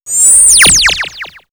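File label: Laser Shots, electronic fx; X-Static Goldmine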